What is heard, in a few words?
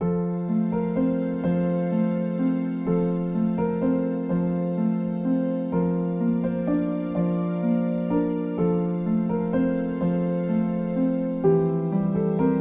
Baby Schlafmusik, Sleep Baby Relax Music